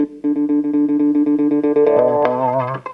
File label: GTR; USB Soundscan vol.32; AKAI